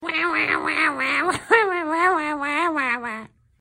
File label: wah wah; grayson